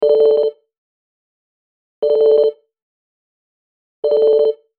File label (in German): Klangbeispiel Telefon Raum